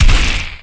Melee Magical Heavy; Kilta

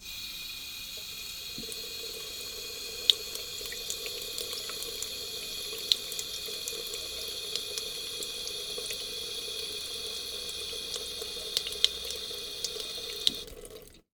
sink; liquid; NS Studios - ultra sound collection

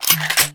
Gun Reload Weapon, Weapons Foley; SFX Kit

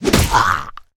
zombie whit; DeadFright

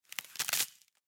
Clematis; Blind Quest - The Frost Demon

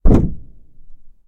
roof body impacts; Blind Drive

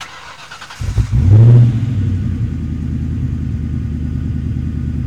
Truck Explorer Start Loop; Trucks

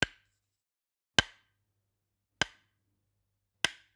Generic Button Wood Tiny; boom library; The Interface

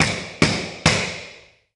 Boxing Slap Stick 10 Seconds

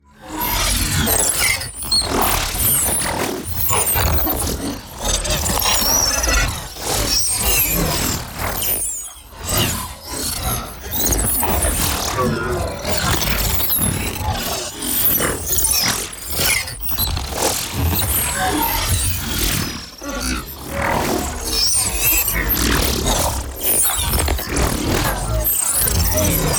PARTICLES LARGE FAST Cyber Swarm; NEON; boom library

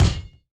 Hit Red Ball Bounce; SFX Kit; Foley - Misc

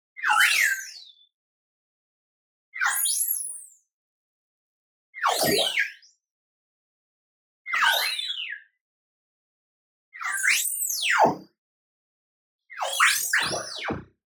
tonal, friendly goodbye; boom library; Anime Essentials

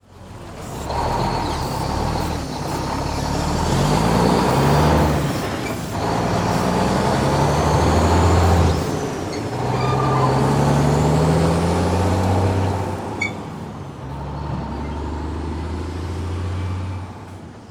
Semi Truck Away; Large Vehicles; Blastwave

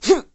Boxing Male Punch Grunt

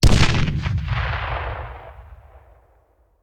Grenade Hand; Sound Ideas; Guns

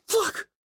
histeric short; donnie; Blind Drive

